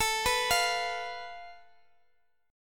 A Suspended 2nd Sharp 5th, Guitar, Open G

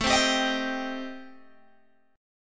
A-Minor 7th Flat 5th, Guitar Open G, chord

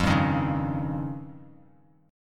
F 7th Suspended 2nd Sharp 5th F Bass Standard; F7sus2#5 strummed; 13,10,13,6

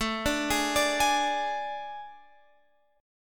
A Major 7th Suspended 4th, Guitar, Open F; AM7sus4; arpeggiated; x,16,14,15,17,15

